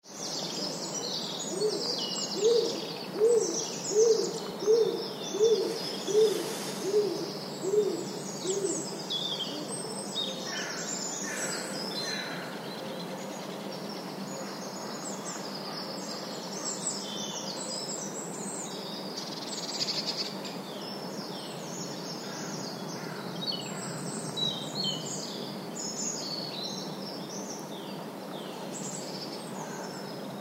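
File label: stock dove voice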